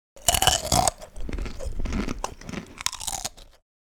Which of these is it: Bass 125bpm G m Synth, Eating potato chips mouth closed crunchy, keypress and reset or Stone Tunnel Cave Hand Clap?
Eating potato chips mouth closed crunchy